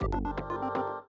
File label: level Up Sound; hexhollow